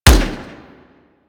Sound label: Fire Shot; Warframe; Weapons; Burston